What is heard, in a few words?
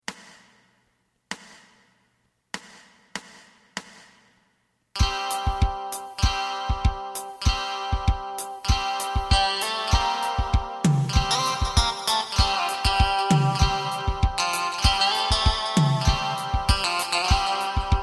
bass